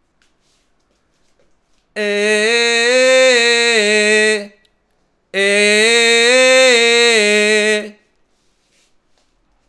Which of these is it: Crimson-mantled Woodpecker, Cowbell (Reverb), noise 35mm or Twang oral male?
Twang oral male